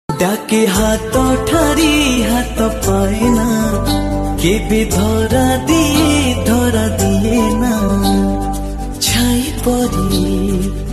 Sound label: odia song old romantic